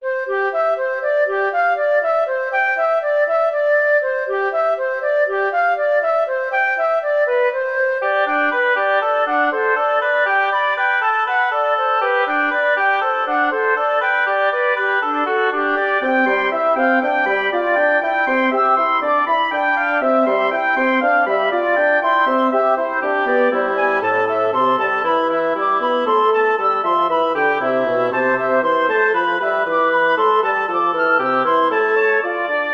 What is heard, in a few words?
Fuguette in C